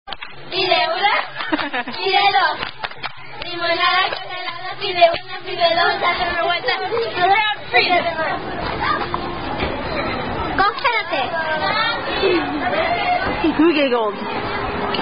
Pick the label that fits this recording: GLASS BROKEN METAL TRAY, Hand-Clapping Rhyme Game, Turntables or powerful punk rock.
Hand-Clapping Rhyme Game